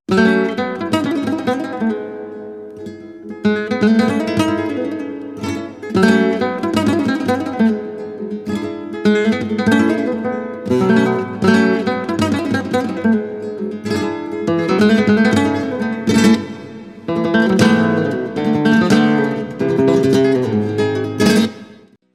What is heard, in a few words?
Solea